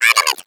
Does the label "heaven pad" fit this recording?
No